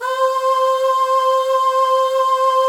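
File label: PAD, atmospheric pads; Best Service; XX Large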